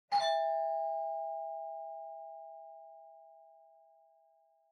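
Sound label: Doorbell Mechanical Tone Far; ドアベル 機械式 トーン; 遠い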